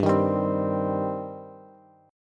G Suspended 4th Sharp 5th G, down, Bass; Gsus4#5 strummed; JGuitar

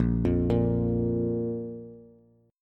A sharp 5th A sharp Bass

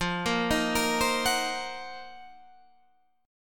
C Minor 7th Flat 5th F, chord; Cm7b5/F arpeggiated; JGuitar

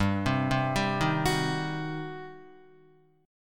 G Major 7th Suspended 4th Sharp 5th, Guitar; arpeggiated; 4F,4A,5C,5G,5C,6E

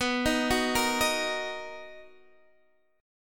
D sharp Minor B, Guitar

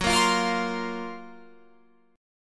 F sharp Major 7th Flat 5th, Guitar, Open F; F#M7b5 Chord; strummed; 18,17,17,17,20,19

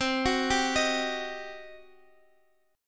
G Major 7th Suspended 4th Sharp 5th C

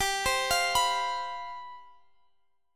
C Major 7th Suspended 4th, G, guitar chord

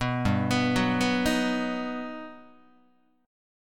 G Augmented Major 7th, Guitar; G+M7 arpeggiated; JGuitar

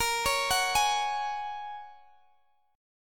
Bb Minor 7th Sharp 5th, Guitar, Open D; x,x,20,19,21,18

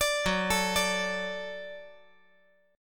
Gb Minor Sharp 5th Gb

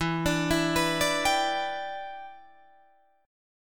E Minor 7th, Guitar, Drop D

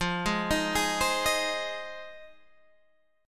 F Minor 7th F Guitar Standard; arpeggiated; JGuitar

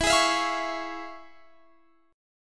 F Minor Major 7th Sharp 5th, E

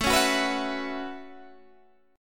A 6th Add 9th A down Guitar Open G

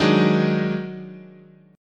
D sharp Minor Major 7th Double Flat 5th, chord

